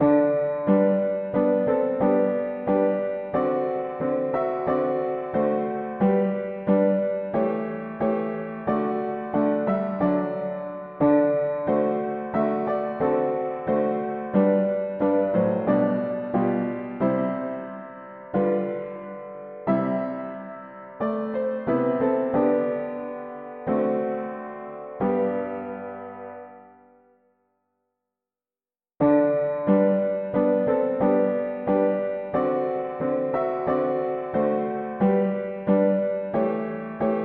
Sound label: mono piano, hymns